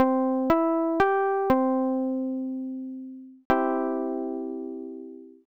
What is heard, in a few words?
guitar